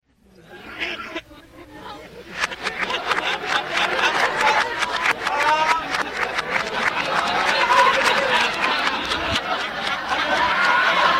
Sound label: laugh track